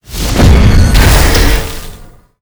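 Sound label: guardian Bastet attack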